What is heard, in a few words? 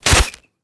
Arrow Loose